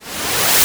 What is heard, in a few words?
Misc Short FX